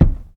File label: vent step; Golden Crayon Sounds